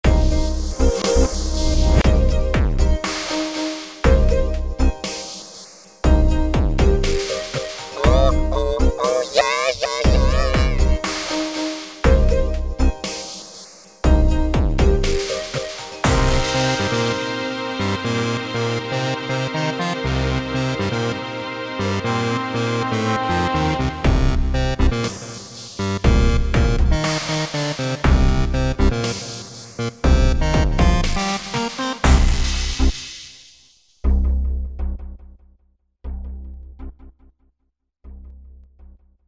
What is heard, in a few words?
Fun Jingle